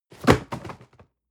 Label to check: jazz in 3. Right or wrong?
Wrong